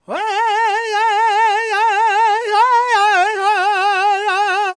G# MIN, World Voices, LAKOTA; Spectrasonics Vocal Planet CD5

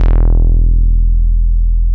MOOG1 BASS E1

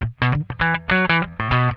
SWING IT, Funk Guitar; Zero G - Funk Guitar

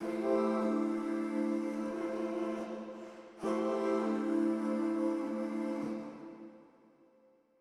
Soul Vocals Am (Wet) 140 BPM, Melodies; 99Sounds x Monosounds

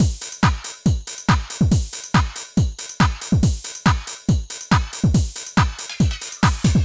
140 C trance kit, Drum & Perc Loops; Trance Explosion Vol1

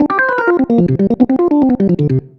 Funky Organ Riff

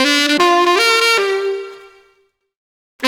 Sax Funky; Drum N Bass; Misc; Sonic Foundry (Sony Creative Software); Knutrix Drum & Bass

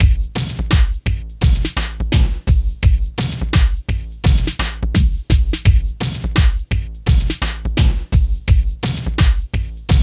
Funky Xtreams techno; INIS

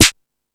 Discoball, drum machine; E-MU Pro-Cussion-1

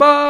VOX BAH D5, Scats Choir; 90s Sample CDs; Club-50 - Foundations Roland; Short Scats

